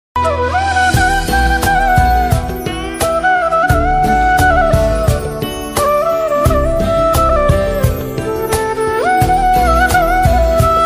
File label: Flute Instrumental Ringtone